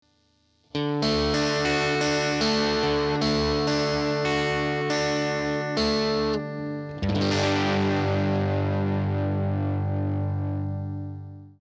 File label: Steinberg UR816C Crunch